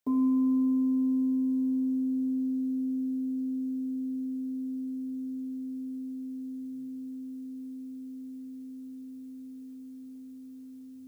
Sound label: ambient